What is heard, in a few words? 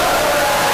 scare; tf; slender; obunga